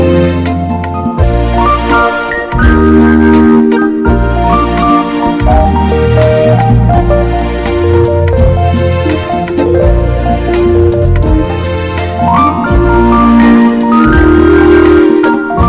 Smooth and Pretty

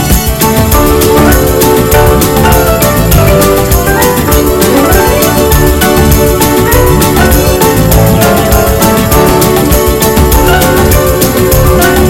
Hot Zydeco

